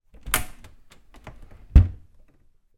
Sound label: household kitchen cupboard door open close sticky; zapsplat